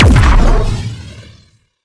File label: Sciweap Shot Large Blaster Plasma Cannon